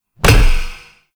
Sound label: Bash Hit; Warframe; Warframes; Hoplite